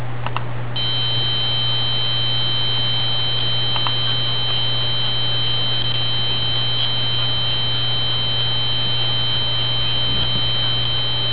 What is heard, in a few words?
modulating tone; modulating tone jack and jill